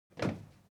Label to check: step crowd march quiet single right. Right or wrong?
Right